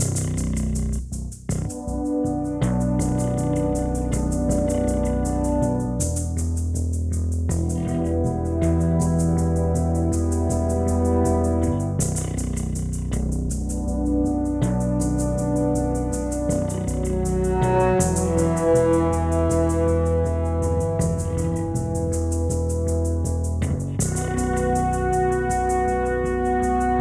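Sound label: Exploration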